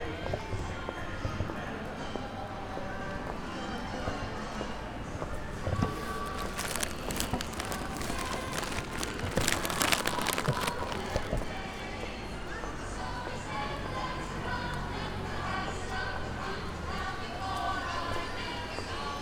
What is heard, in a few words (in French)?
marche de noel, centre ville